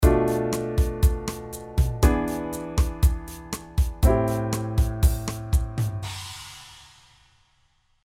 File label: Am7 end